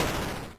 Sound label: light ball explosion